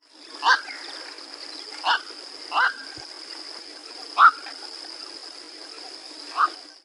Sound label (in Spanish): garza bruja, aves